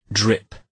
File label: drip